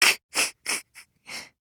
kehehe

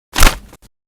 money

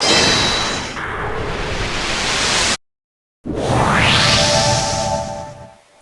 logic win

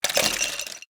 ice add; va-11

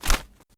money

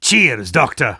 cheers; spy

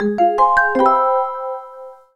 jingle

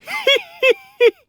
giggle

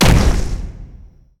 impact

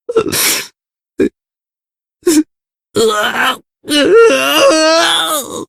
sobbing

